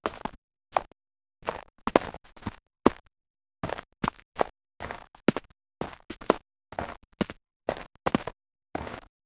footstep light leath

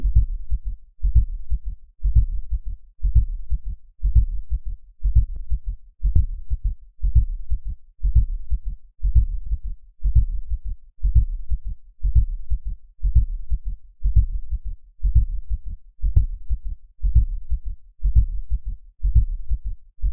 alien heartbeat organic

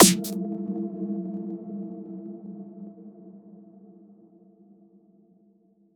idm one shot snare; electronic music

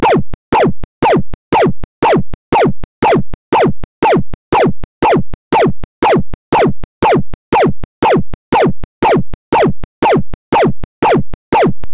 shoot gun; with 1 sec

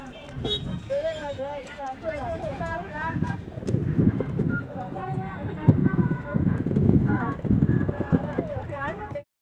ancient indus valley village

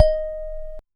a clean dry audio recordi, musical instrument

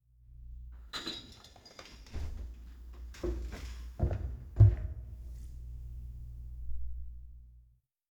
slow measured footsteps; cavern

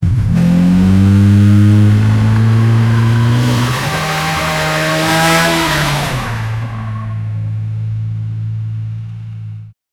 a 1967 ford mustang speed

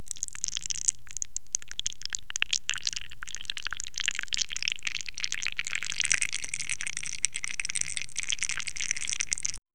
slimy cube melting, dissolve

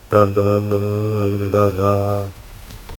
kaboom with a cute baby

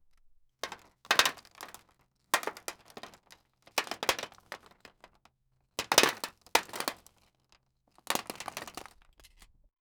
the light clatter of plas, items

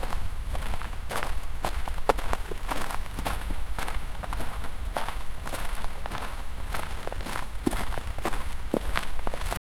footsteps running on wet, wet asphalt

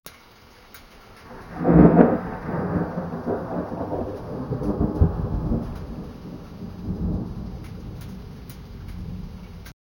thunder rumbling in the d

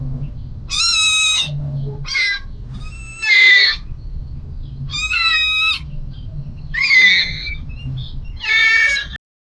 parrot, bird

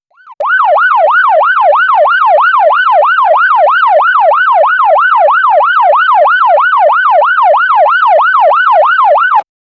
distant police siren getting closer

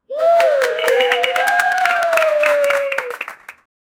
cheers with glass of beer; food and drink

